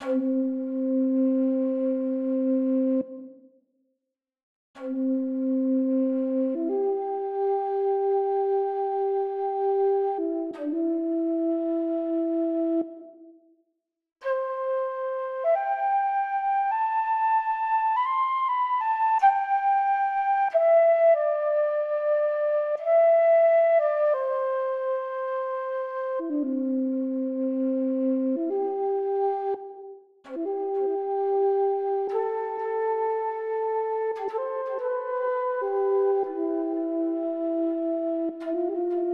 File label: Quena